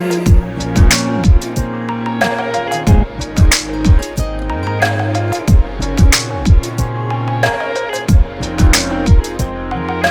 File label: Instrumental; Sadak 2 Movie